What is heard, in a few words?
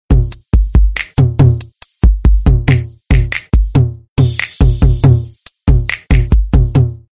drum instrument ring